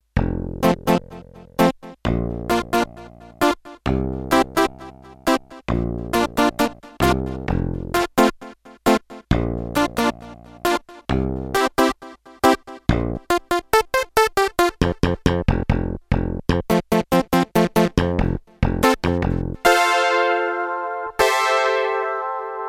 Synth Combo, Roland D-10; Factory Patches

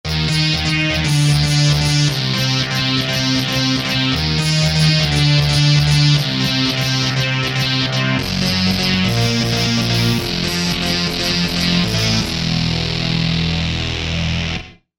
Lead Dirt

